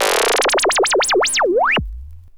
Jupiter4 Laser LFO Vinyl Synths C3; vinyl fx

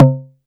Perc; EFM

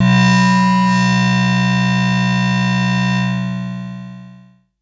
SYNTH Longer Higher

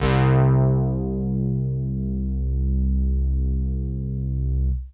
Juno106 PWM Chorus Vinyl Synths C1; vinyl keys

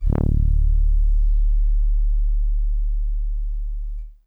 Soft Horns DX100 D1

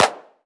CLAP

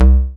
BASS

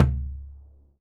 Tom Acoustic Dead

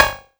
Cowbell

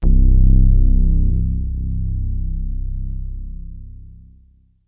BASS Soft Sub Velvet

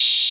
Open HH; Backstage

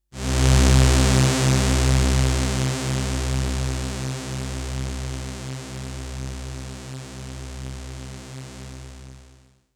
SYNTH

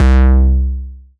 BASS Bwowg

